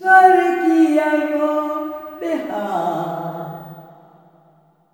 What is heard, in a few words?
LULLABYE, SOLO FEM; Spectrasonics; Heart of Africa 2